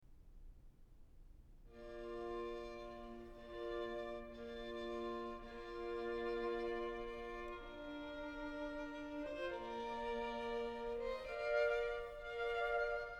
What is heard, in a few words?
String Quartet No. 6 in B-Flat Major, La Malinconia (Adagio; Ludwig van Beethoven; Beethoven - String Quartets No. 1-6, Op. 18